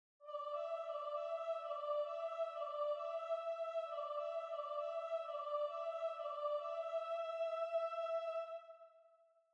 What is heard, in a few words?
soprano; poulenc; la sauterelle